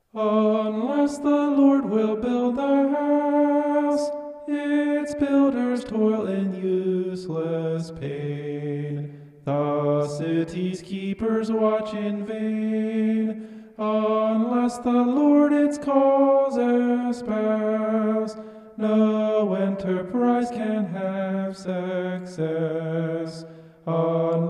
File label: vocal homoph; psalm 127